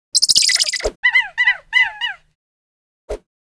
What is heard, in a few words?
Monkey Action